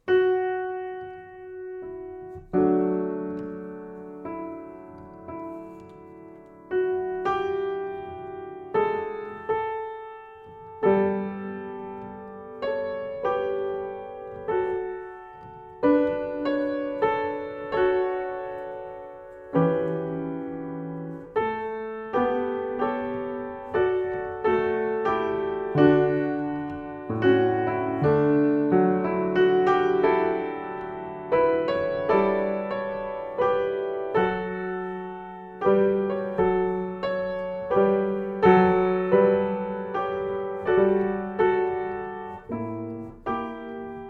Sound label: kay playing the piano